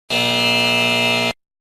INCORRECTO

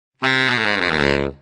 Burla efecto de sonido

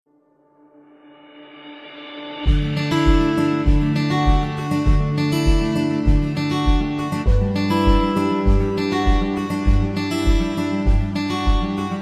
musica suave de fondo; para videos y presentacion

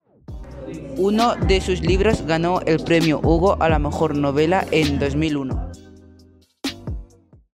Pista de Audio con musica NCS

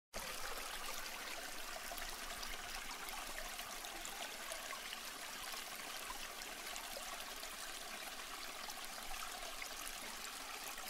Sonido de Agua fluyendo

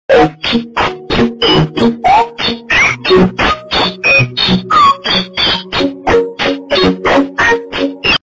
Música de tutoriales pero pobre

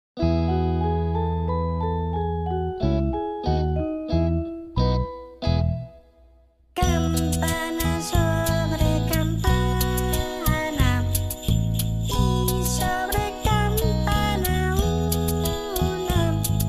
Campana sobre campana tradicional villancico de Navidad

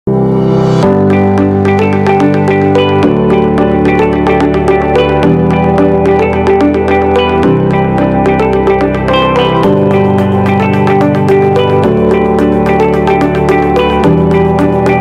Fondo videos; genially

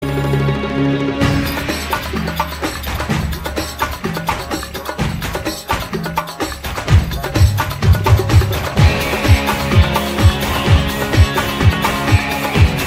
musica arabe

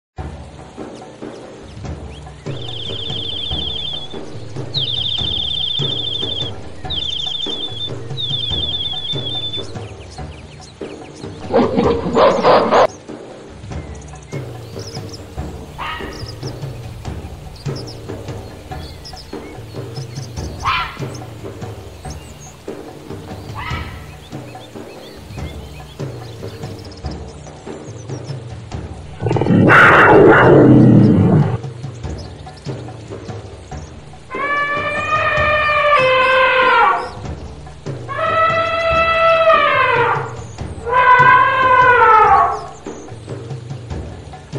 SONIDOS DE ANIMALES DE LA JUNGLA PARA NIÑOS, Ambiente de la

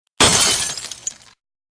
efecto de sonido cristal rompiendose vidrio

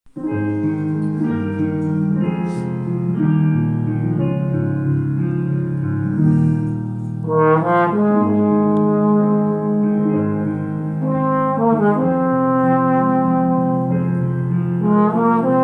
musica trombon cover

